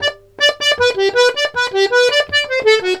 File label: Accordions; USB Soundscan vol.40; AKAI